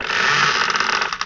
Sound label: door wood